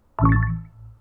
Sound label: old Cast tone